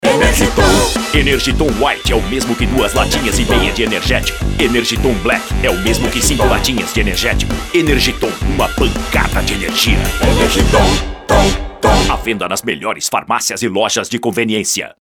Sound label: Jingle; Energiton